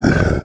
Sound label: sludge die; edgar; enemy